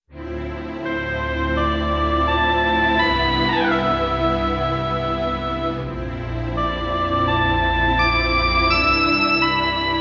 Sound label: dungeon loop